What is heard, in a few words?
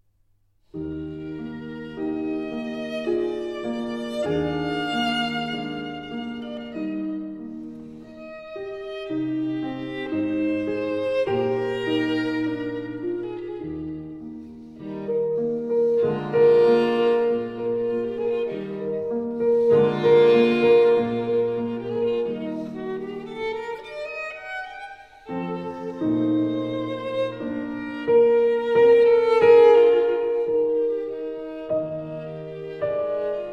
strings